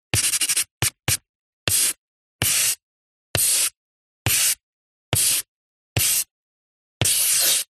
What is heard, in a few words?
Drawing CharcoalStick Desk; D&H; soundbox library